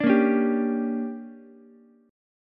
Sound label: D Major 7th Suspended 4th Sharp 5th, Ukulele